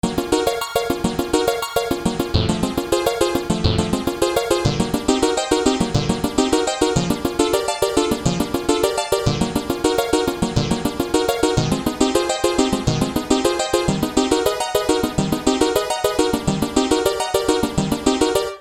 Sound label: cs, yamaha cs1x cs2x